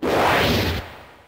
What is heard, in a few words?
Attack; RedDot